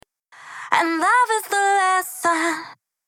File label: chorus acapella dry